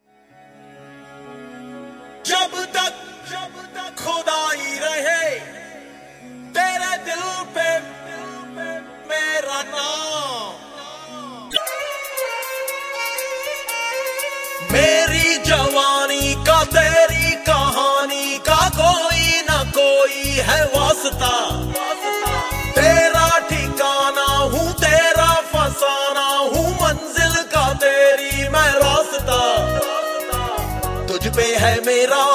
Unplugged

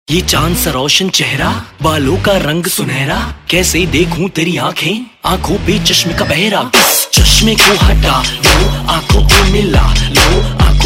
RAP Ringtone; Indian Pop

